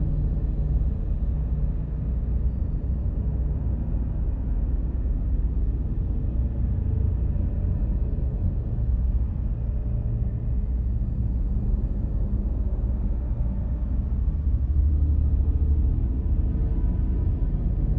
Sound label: ship starbase idle; aquatics